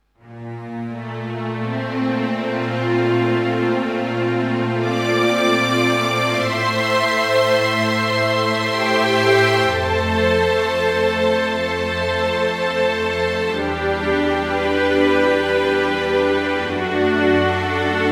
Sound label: Kurzweil Forte SE Arco Strings